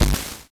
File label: theta dry, weapons